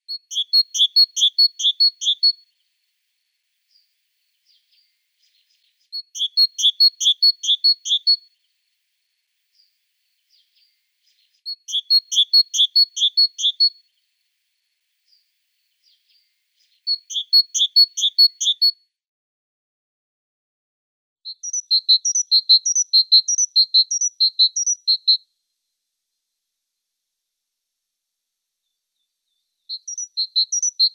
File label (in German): Kohlmeise Parus major